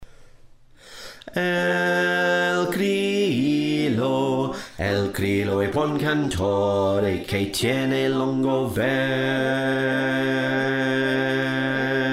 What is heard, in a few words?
Bass